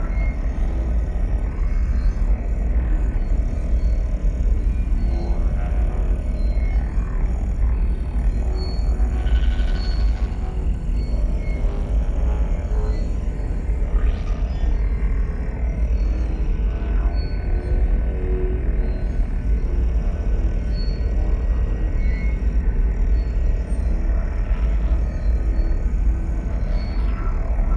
ship cruiser idle; ships; Stellaris; shroud

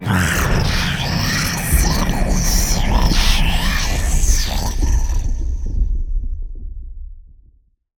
lithoid portrait snake; lithoids; Stellaris

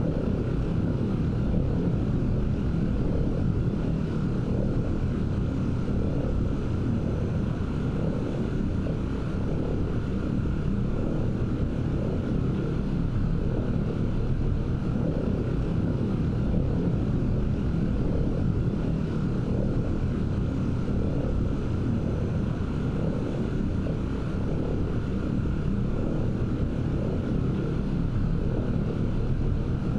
ship transport ship idle; Stellaris; machineage; ships

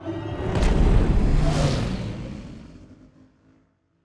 ship laser move; ships; pre-FTL; Stellaris; first contact

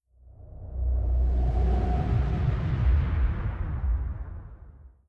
wraith moving; Stellaris; guardians

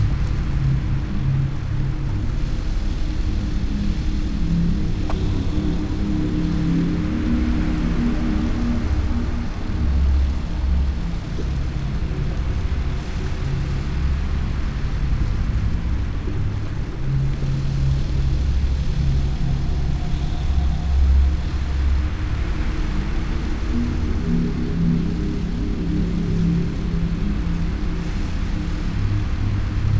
ship juggernaut idle; ships; Stellaris; toxoids

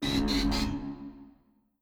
research scientist missing, ui; Stellaris

